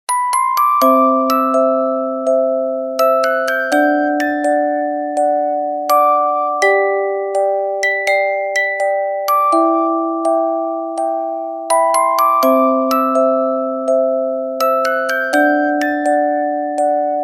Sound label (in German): orgel